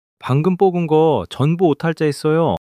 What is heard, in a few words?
surprise